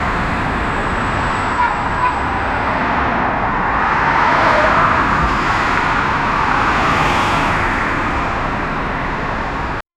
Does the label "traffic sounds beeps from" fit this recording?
Yes